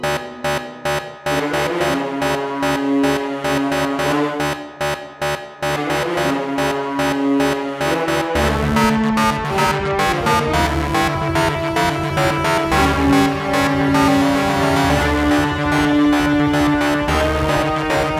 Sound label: endgame music